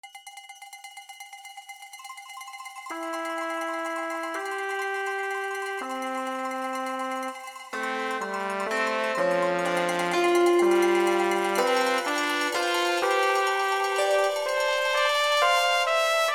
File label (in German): Alpine Volksmusik; Film